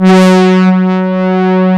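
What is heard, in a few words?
MEMOOG BRASS, Analog Odyssey; E-MU Formula 4000 Series Vol. 3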